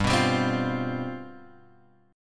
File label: G 11th G, chord